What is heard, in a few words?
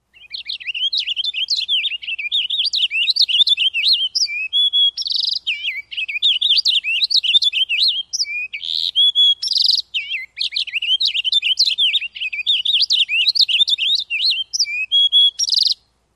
extended song; NY